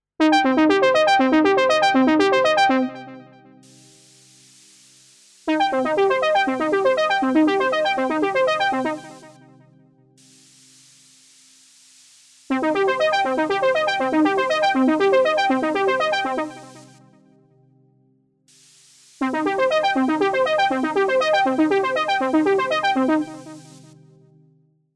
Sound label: Chorus Modes; Juno X